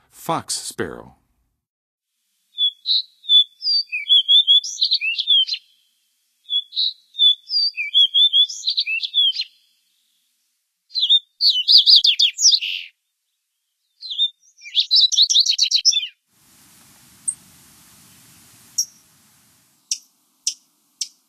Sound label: Fox Sparrow, Songs of Birds of North America; Western USA; Western USA Songbirds